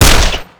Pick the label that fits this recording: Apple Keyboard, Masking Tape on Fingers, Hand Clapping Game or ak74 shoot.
ak74 shoot